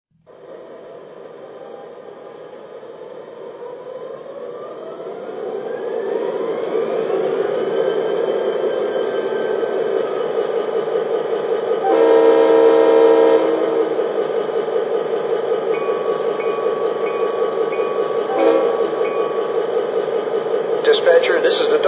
gp38-2; PRSL

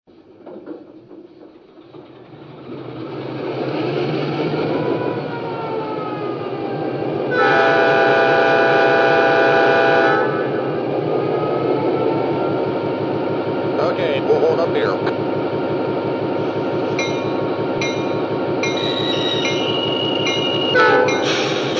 EMD F3

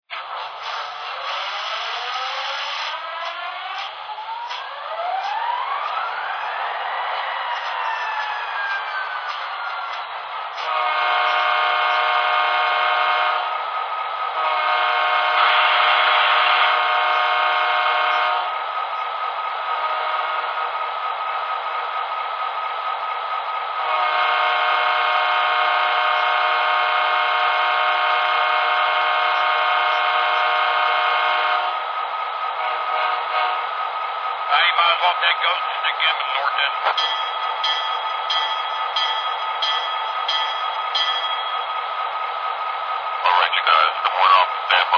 SD45T

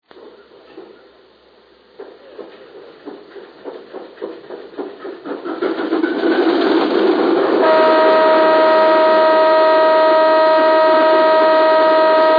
VO1000

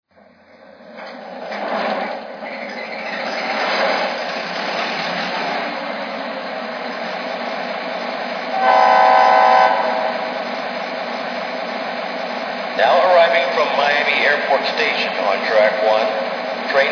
F40PH, trains; trirail

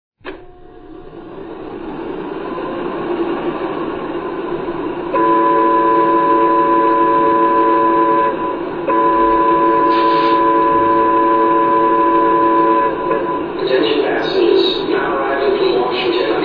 EP5

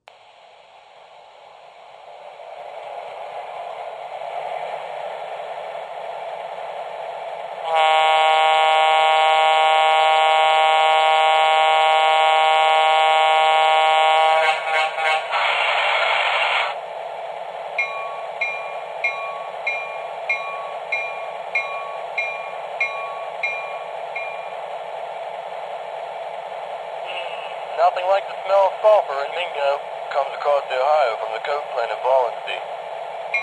sw1200, trains; WPS

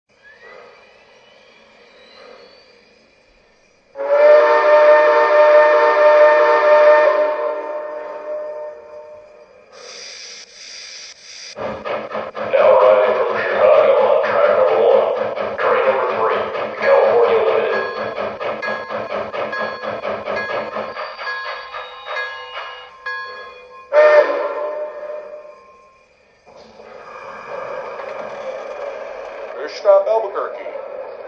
Northern

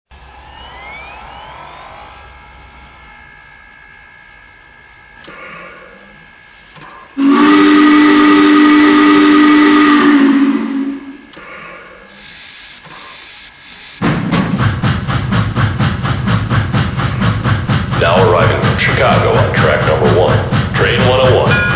HIAWAT

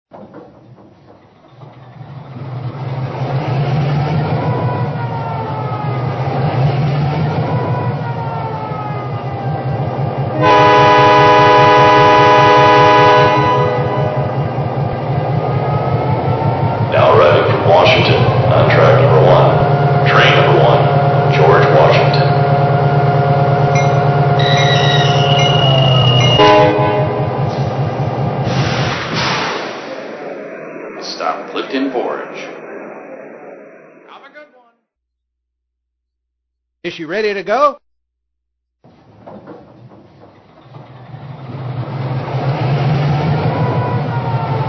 E-8, trains

